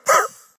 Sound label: Scream